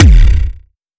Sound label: clean computer kick